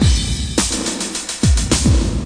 106 humongous crasher, drum loops and breaks; 101 to 110 bpm

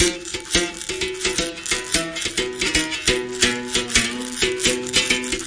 088 berimbau groove; WORLD LOOPS

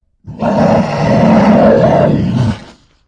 grizzly bear big growl; Zoo and Nature

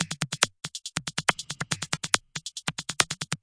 140 bpm, super electro, drum loops and breaks

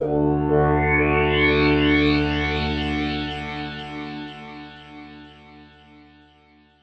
sh101 tone, Synth

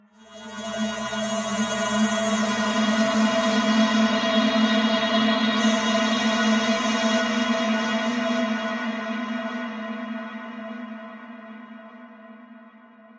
thick warbling synth A3

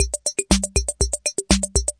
fastrap calculator casio, 120 bpm

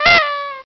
Party Horn

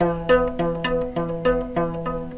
afro harp octaves; WORLD LOOPS

